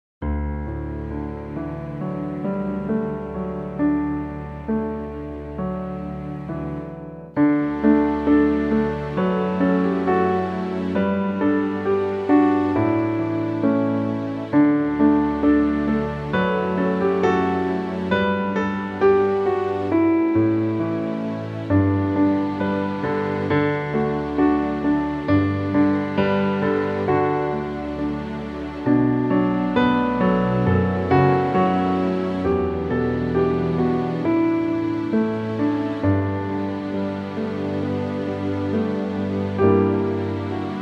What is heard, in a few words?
Lullaby